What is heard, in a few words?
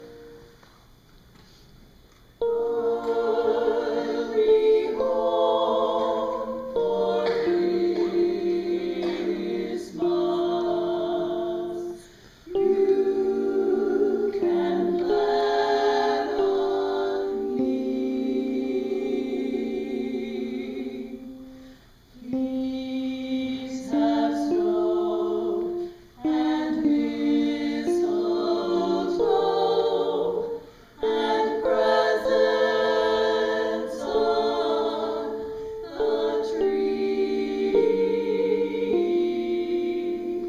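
soprano 1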